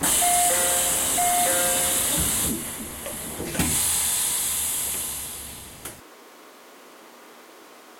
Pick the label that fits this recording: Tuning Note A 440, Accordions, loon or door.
door